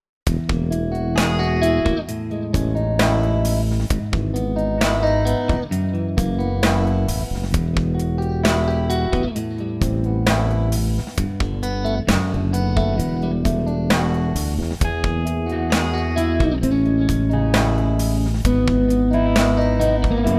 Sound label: crunch; Velluto; Fusion; Clean & Crunch